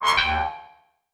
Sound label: Multimedia Internet CD-Rom Flash Hi-Tech